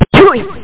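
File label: power down; pacman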